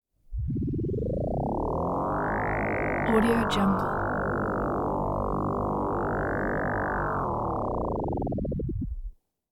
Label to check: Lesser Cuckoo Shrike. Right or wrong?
Wrong